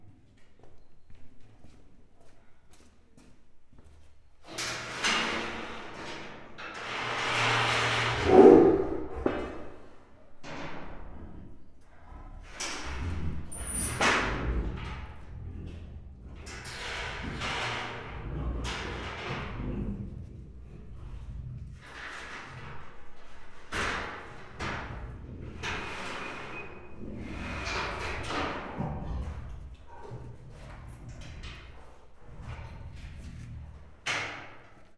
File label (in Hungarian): etetes racsoklakok, bengalitigris; miskolci zoo 2009